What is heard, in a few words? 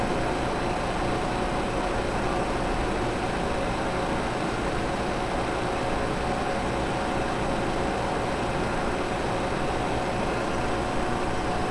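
industry machine cacao workshop blender running room ambience loop; fvtt sonniss gdc audio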